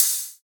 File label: Ride, cymbals; Rides; Future Bass; Drums; One Shots